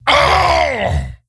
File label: Wound Crit; Kologarn